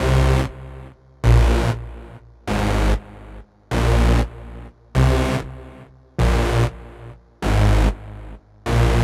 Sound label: Bass Synth 95 Cm, Reggaeton Producer Grooves